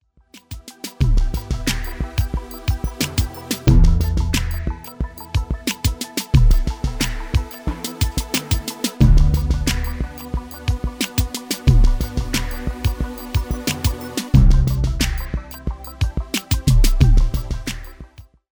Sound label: transformation trance